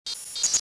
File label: fuelpump